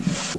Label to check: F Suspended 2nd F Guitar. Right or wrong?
Wrong